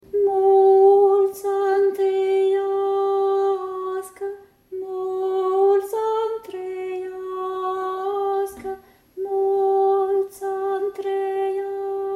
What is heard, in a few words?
alto; multi ani traiasca